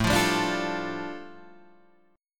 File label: A 7th Suspended 4th Sharp 5th A Guitar Half Step Down, chord